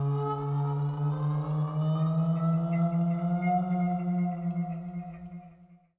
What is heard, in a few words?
VOICEFX ETHNO 160; eJay